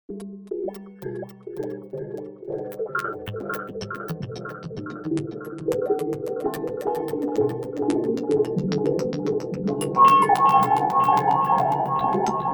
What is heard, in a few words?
Cosmologic Beat; Cosmology